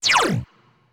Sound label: menu open